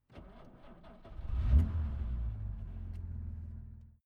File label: car jaguar e-type startup interior